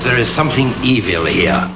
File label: evil; dmc